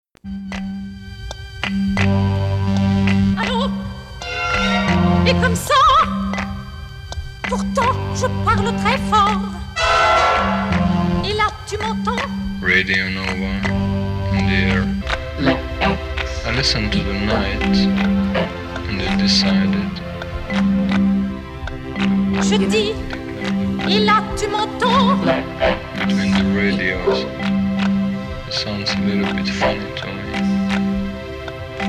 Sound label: Radio Nova Jingle; Nova 25 ans - 1981